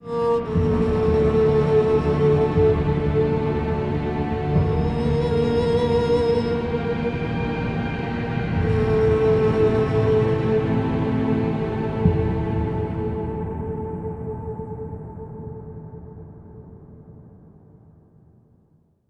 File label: music death